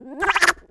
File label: Spit; Mother Maiamai spitting out a new item; zelda